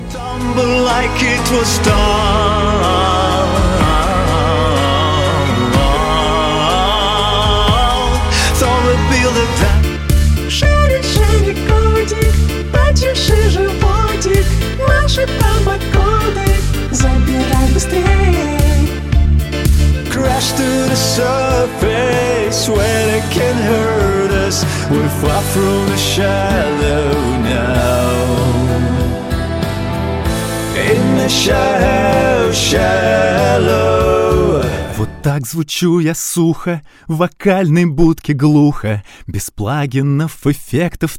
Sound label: Vocals